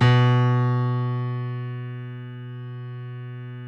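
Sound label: B1 piano; Northstar - New Gold VOL-2; BOS.GRAND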